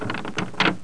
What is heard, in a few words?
scroll; My Science Fair